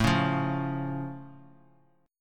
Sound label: A Augmented A down; strummed; JGuitar